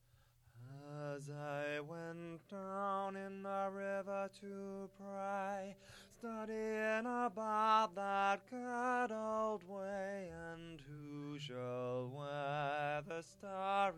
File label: Bass; As I went down in the river to pray